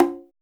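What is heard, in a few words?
DYN conga, Drums, HIP-HOP; Drums In Your FACE!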